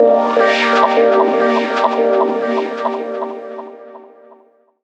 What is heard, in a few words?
PAD MOIST FX; Rawcutz - Nu Forms; Gbm